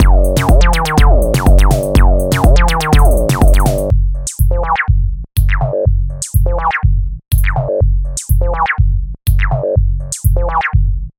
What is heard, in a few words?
Acid House